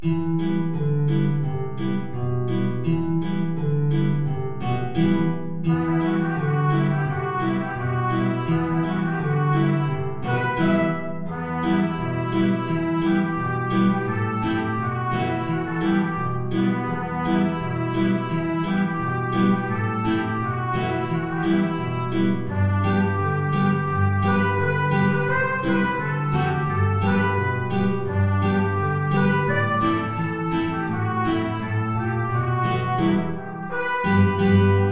Trompete in Bb & Gitarre; Chiribim Chiribom

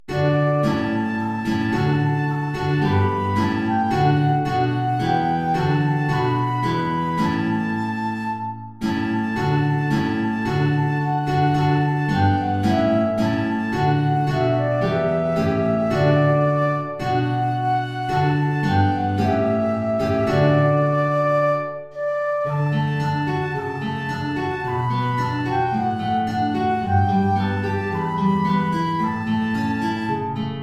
Querflöte & Gitarre (hoch); Lobt Gott, ihr Christen, alle gleich

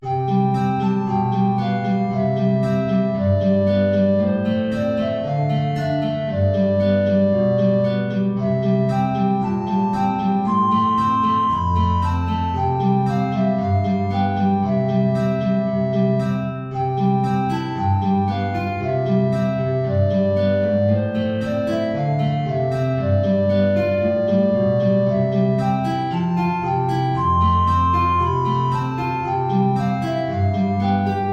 Altflöte & Gitarre, hohe Notierung; Alle Jahre wieder

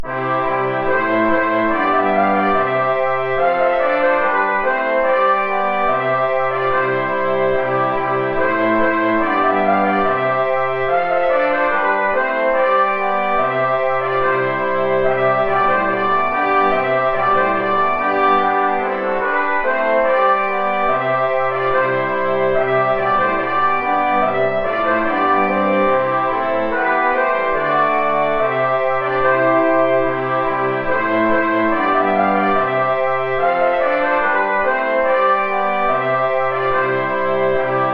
Bransle de la Royne (2 Trompeten in Bb & 2 Posaunen)

